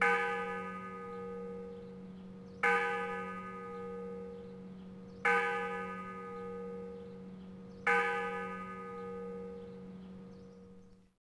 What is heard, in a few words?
Church Bells; Ambient; Civ4 warlords